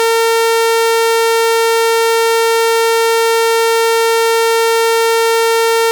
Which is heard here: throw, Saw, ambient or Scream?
Saw